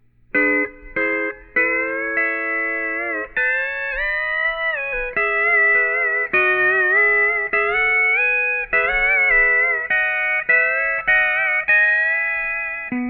Pedal Steel lick; eminence double-t 12; Travis Toy

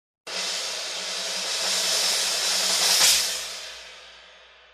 hhx evolution ozone crash 16; sabian

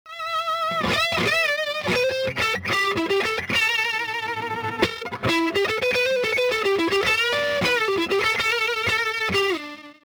Ibanez TS9, effector

